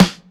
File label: snare mid wire